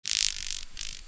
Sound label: Amb Bug; Voices of the Void